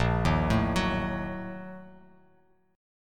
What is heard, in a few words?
Bb-Major 7th Suspended 4th Sharp 5th, Bass Standard; arpeggiated; JGuitar